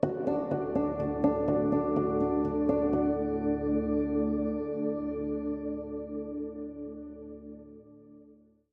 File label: Bittersweet Contemplation, Bumper